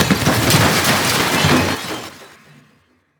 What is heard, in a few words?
Explosion Debris; Weapons